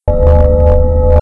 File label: Teleport; Playable Tutorial Level